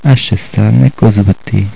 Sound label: lose